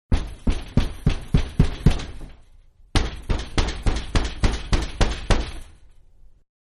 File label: Garage Door-Banging On-Outside; Garage Doors (Sound Effects:Doors); Manhattan Production Music